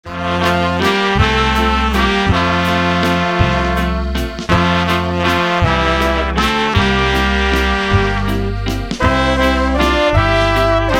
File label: Waltz